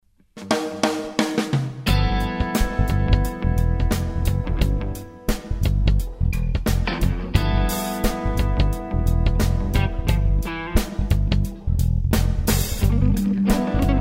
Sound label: Funk Blues Rock Band